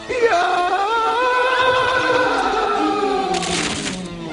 screaming; pooh

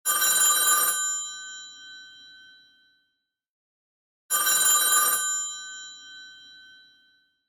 telephone bell; gta

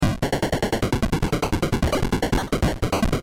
8 bit snares

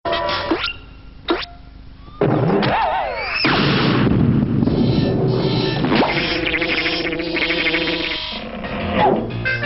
gets flattened; mcwolf

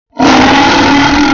cute uwu burpy

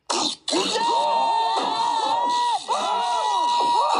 scream; pickle

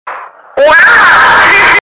waow loud

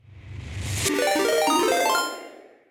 level up; raid

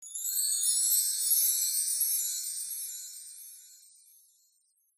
chime

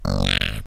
notification sounds; pou

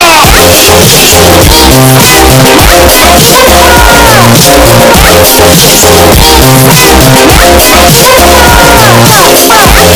bass

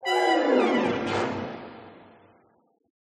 brass attack

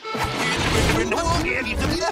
singing in reverse; mr krabs

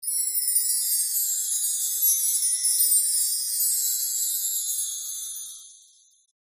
chimes

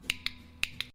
clicker button

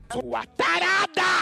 tarada

